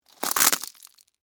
Crack Crunch, Texture, Crack, Crunch, Penne, Rigate; Food Sound Effects; Household